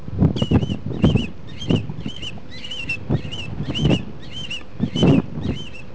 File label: birds